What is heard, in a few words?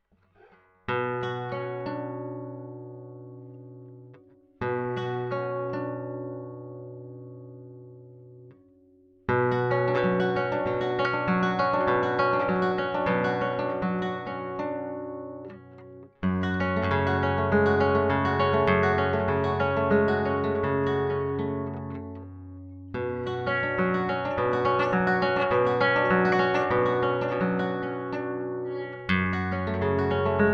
yamaha g 90a, guitar